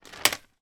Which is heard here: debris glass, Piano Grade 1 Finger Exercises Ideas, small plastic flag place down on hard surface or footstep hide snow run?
small plastic flag place down on hard surface